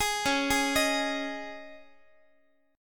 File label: C sharp Suspended 2nd, C sharp, Guitar; C#sus2; arpeggiated; JGuitar